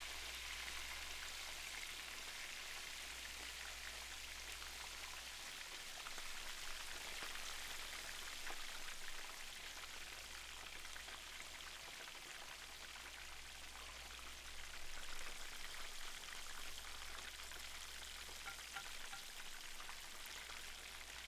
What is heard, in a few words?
water fall